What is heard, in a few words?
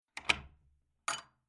Household Old Farm House Metal Door Unlatched Open Two Parts